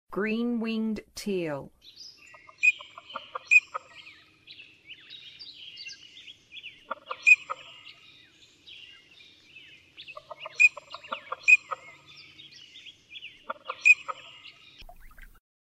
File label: Green-winged Teal; North America